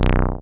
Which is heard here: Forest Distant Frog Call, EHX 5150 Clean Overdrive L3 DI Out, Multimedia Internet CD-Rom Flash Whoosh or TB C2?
TB C2